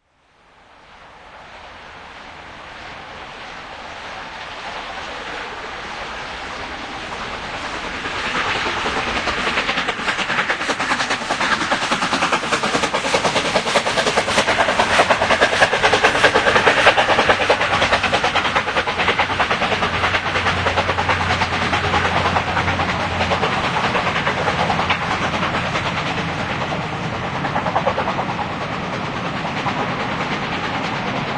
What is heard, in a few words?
71000 passing St Andrew's Junction